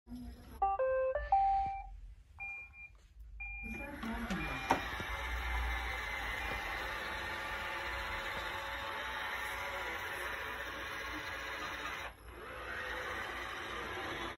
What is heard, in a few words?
Robotic sweeper